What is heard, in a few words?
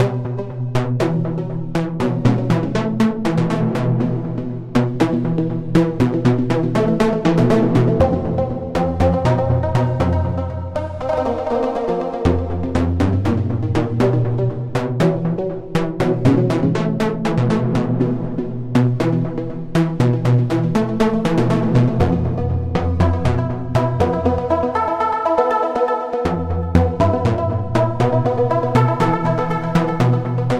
Horns